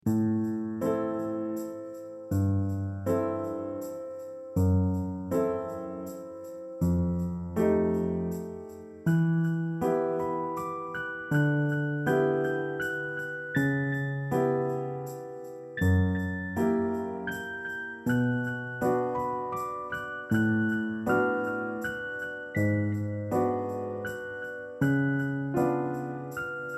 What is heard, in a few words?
Inside the store after closing, bgm